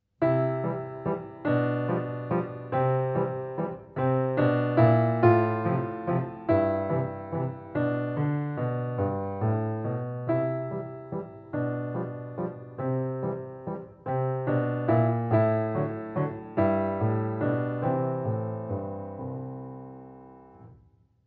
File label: Piano Waltz